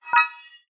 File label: multimedia notification short digital futuristic beep generic